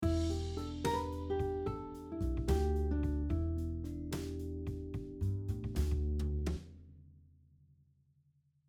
Rhythmic idea 1, E minor blues scale using different note lengths, guitar